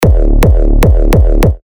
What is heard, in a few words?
Kick D; Matrix; Revealed Terra Kicks